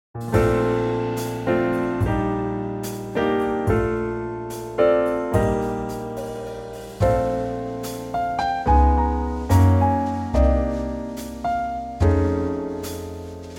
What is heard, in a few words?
Bb Trio; key - Bb - vocal range - F to G; very lovely Trio arrangement